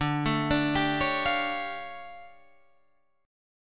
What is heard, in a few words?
D Minor Major 11th, down, Guitar, Open G